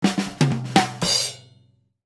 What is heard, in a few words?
Jazz email alert; Nokia; N78; Audio themes